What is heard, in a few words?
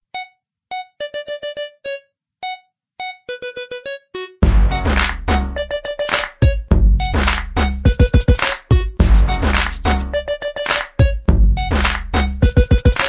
sms new, ringtones